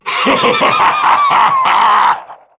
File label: Death Laugh; Warframe; Galleon Of Ghouls; Vay Hek